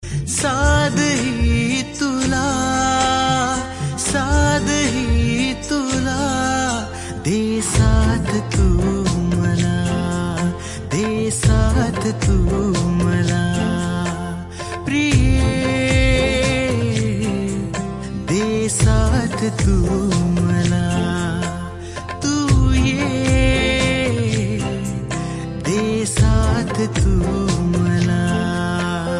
Marathi Ringtone

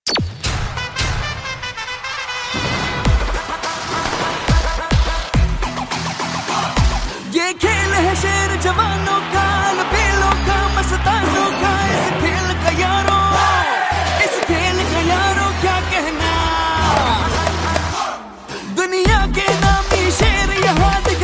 Theme Song Ringtone; IPL 2018

